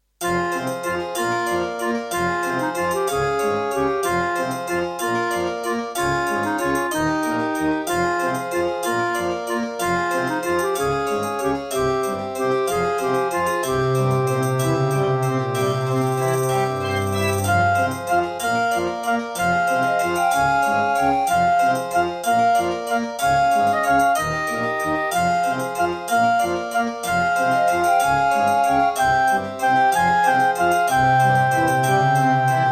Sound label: Track 6, Roland SC-88 Pro 88 MAP; Death Gate